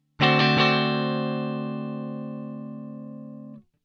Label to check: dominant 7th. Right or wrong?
Right